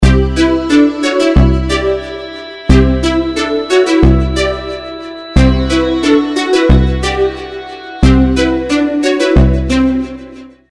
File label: Lydian ringtone; Electronic, 2009